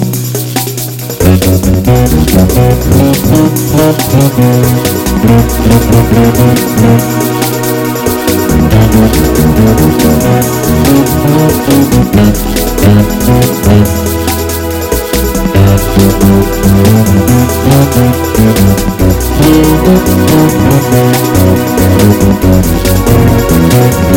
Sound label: Great Bossa Nova